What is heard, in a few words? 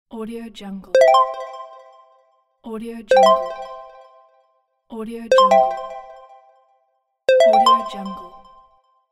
the correct answer